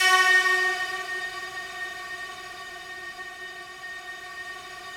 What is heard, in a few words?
FOGATK3 F#3, Yamaha VP-1; Music Store; 90's Sample CDs; FOGATTACK 3